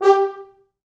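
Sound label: French Horns staccato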